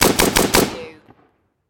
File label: Machine Gun Bren